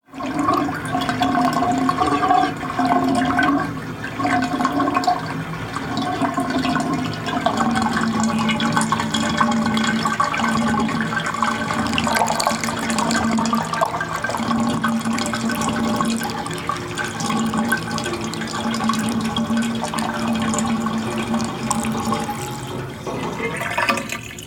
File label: household bath water going down drain; sound effects one